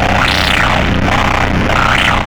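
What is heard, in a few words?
RUMBLE right, Hard & Loud Techno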